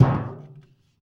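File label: BIG TIN, percussion metallic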